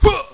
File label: GRUNT M; ROADRASH